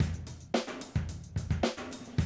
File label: 110, Classic Drum Loops